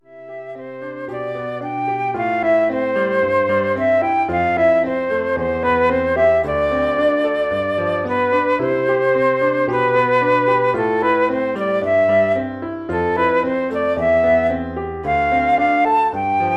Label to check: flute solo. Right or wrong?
Right